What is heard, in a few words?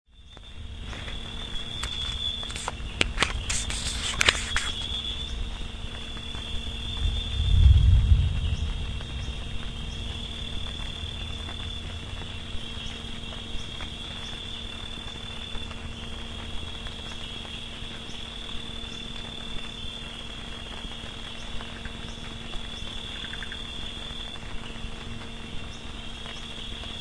five frogs and thunder